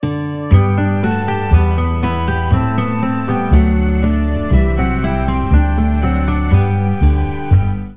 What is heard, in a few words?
ringer; Ringtones